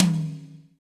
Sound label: wet tom drum sound e key, Royality free tom one shot tuned to the E note. Loudest frequency: 1937Hz; tom drum samples